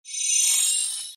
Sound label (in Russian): zvezda prolet